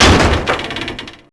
Shelf Falls; Max Payne; restaurant

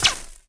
laser Bolt hit; impacts explos

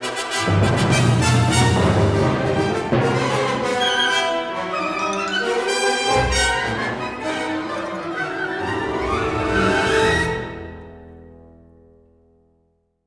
battle, music flourish

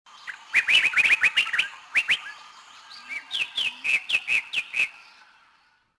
bird; yavin

